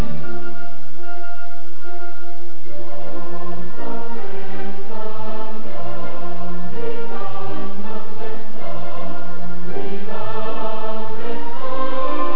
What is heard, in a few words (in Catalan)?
himne